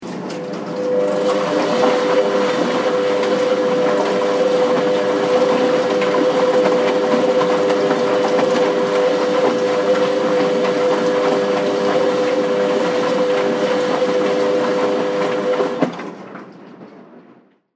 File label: Foley Washing Machine